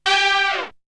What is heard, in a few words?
Hit; ZERO G - Datafile DISC 1